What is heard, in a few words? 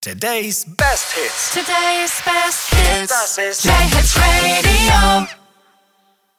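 Sung Jingles Radio, 125bpm G minor, STING; JayHits Radio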